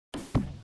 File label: wall place; sims 4